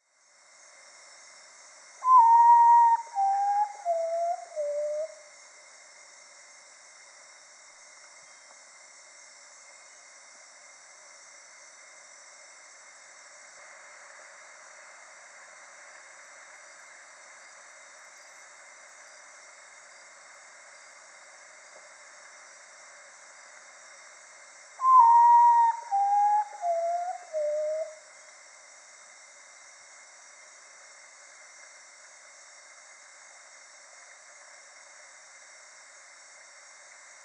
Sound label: Common Potoo Nyctibius griseus